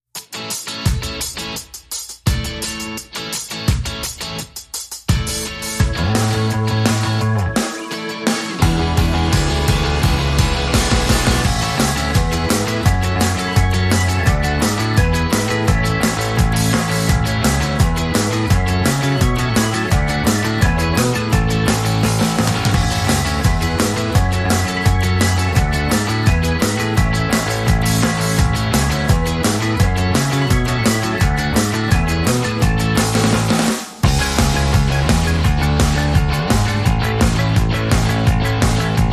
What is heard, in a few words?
No Vocals No Lead Guitars Mix